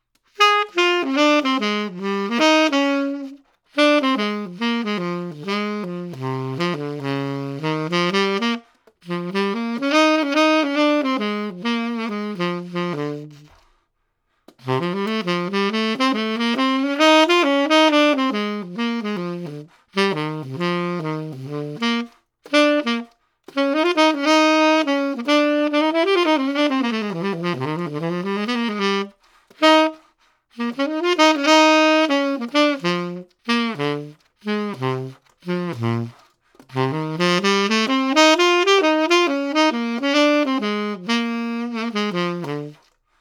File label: Blues; Lamberson J7 2021